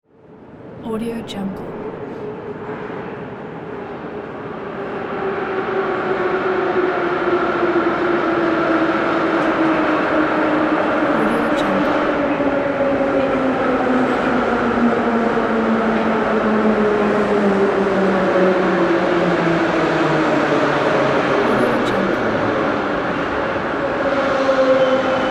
train underground

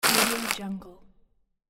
paper crumpling

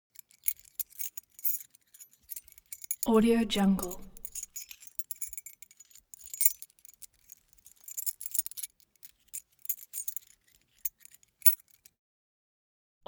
object key key ring small rattle sequence

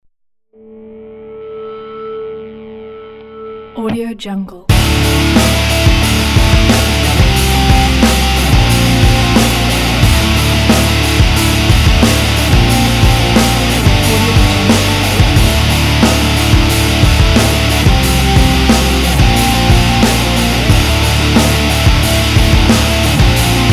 alternative rock; tumblerstudio

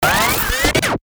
slam dunk rewind; sound ideas

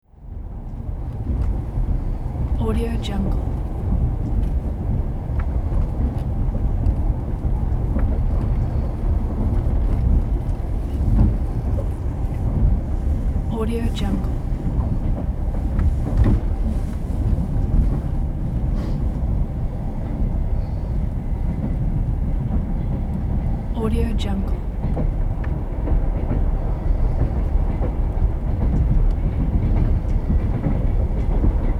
train inside carriage ambiance

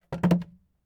chair drop

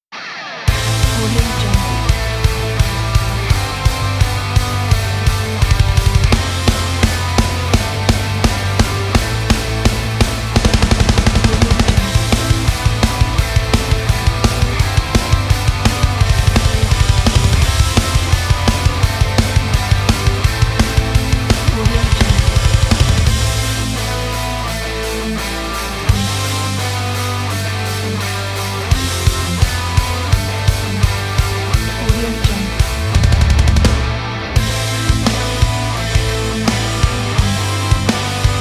outback trucker wildlife punk

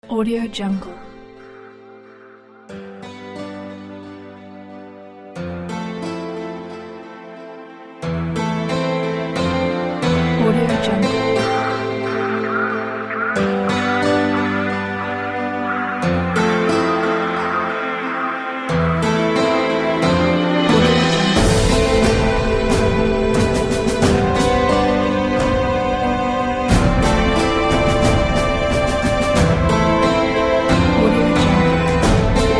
epic action trailer

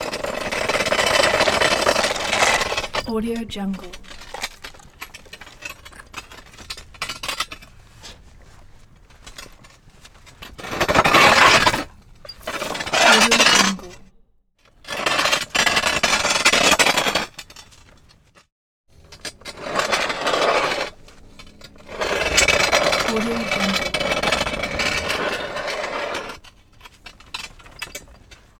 wagon wheel large wood spoked metal scrape

